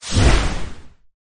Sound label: asteroid; crossyroad